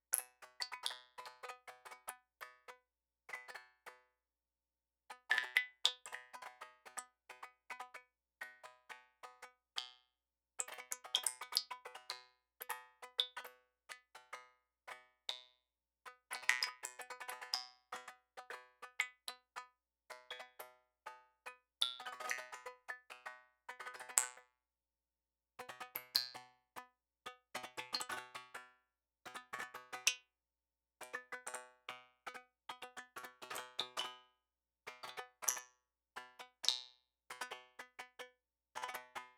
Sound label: Creek, Garden